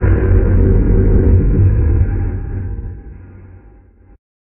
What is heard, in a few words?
Negative